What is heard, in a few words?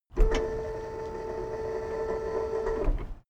car lift up